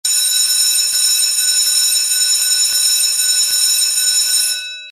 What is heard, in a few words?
RING LONG; Football